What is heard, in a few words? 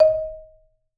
marimba soft D#4, Marimba; Sampleheads - Dave Samuels Marimba & Vibes